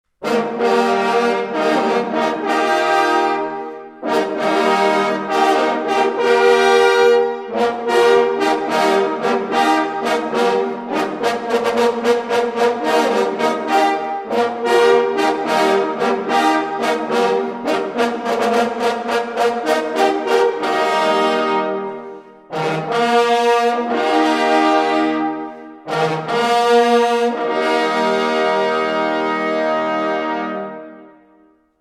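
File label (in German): GAMS TOT Jagdhornbläser; Schnals; Similaun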